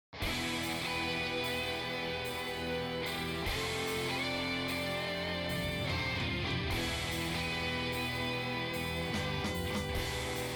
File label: Metal Box